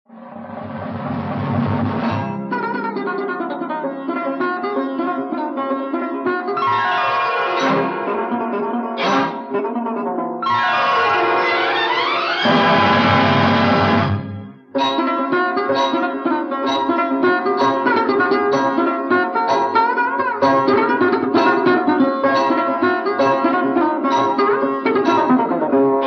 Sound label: Theme Instrumental; Baharon Ke Sapne